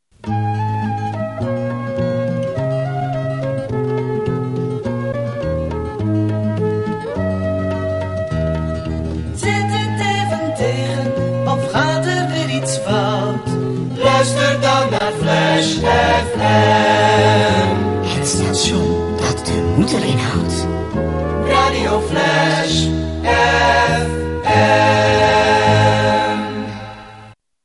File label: jingle; Flash